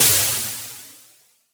mecha steam release

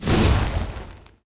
normal attack; faustus

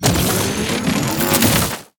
enemy storm basic evolution; sfx

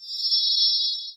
Ice Shard Summon; Elora

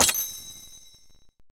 hero skill confirm

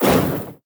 enemy lava basic flame; sfx

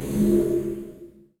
Enemy Healing

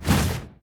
wrath flame; veznan

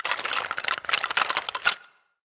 dry bones reform; sfx

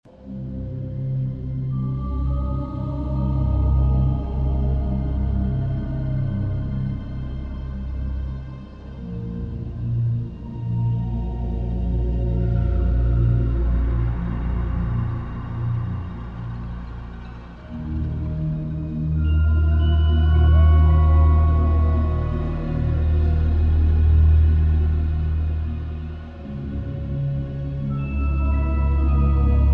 Halloween Pre Battle

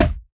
gator melee; crocs

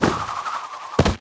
tower pandas arrival single

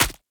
tower demon Pit big Guy basic Attack

